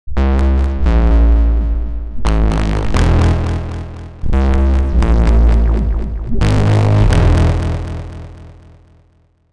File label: wobble; sonivox